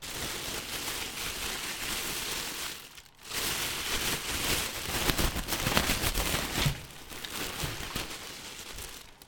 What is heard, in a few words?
handling plastic waste bag stereo